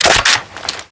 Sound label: fal reload chamber